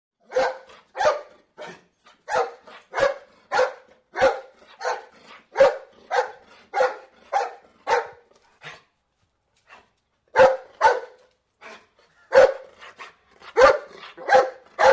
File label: Dog Large Barks